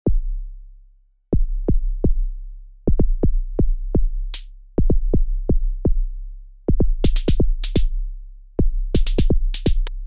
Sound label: Korg Volca Drum Random Beat